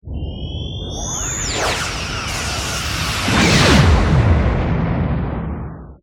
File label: phaser strike, explosions; TNG